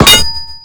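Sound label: metal pipe burst; Explosions; Call of Duty Modern Warfare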